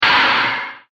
Sci-fi Smash Blast; science fiction sound effect

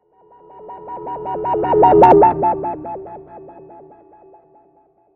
Hover, Robot, Pass, By, Scifi; Sound Effects:Science Fiction; BlastWave

